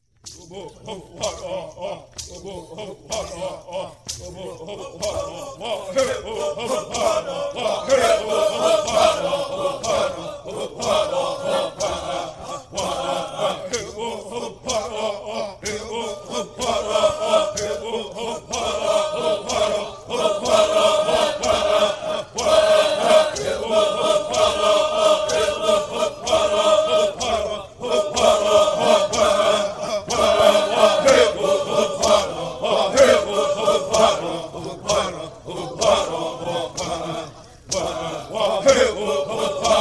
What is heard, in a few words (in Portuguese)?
Canto da corrida de tora de buriti